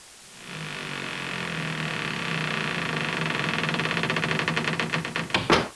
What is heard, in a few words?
old squeaky door